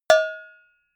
cowbell hit hard

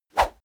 whoosh small fast

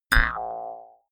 mouth harp

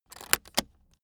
aluminium case close buckle

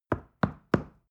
stone wall knock

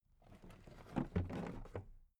toolbox put down soft

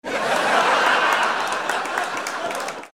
laugh applause

